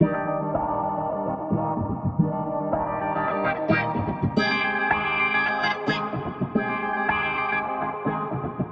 110 A Summer Sun, Spaced Out Guitars; Loops; Touch Loops; CosmicFunk

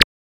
Glitch, One Shots, Glitch FX; Touch Loops; CosmicFunk

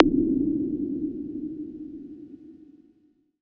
FX SHOT TEKNO 140; Techno2; Singleshots

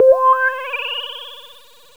VIBRATO KEY 3; KillerTweaksII; DirectWave

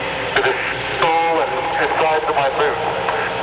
techno vocals 140

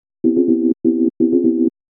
house synth 125 A, keys

